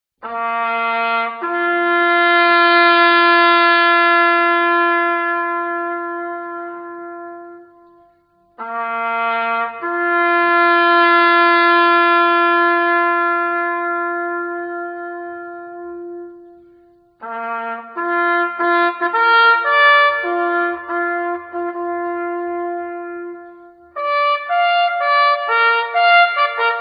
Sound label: Last Post, bugle